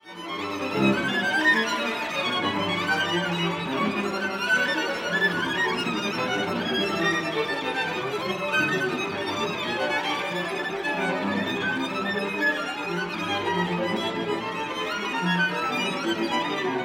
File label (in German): Streichquartett; Cerha; 2. Streichquartett